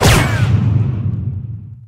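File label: darts live double bull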